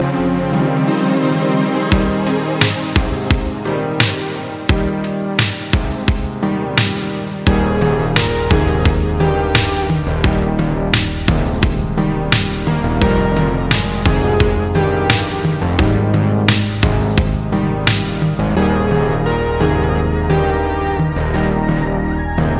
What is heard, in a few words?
NeoSynth; vaporwave